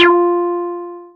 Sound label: Synths BASS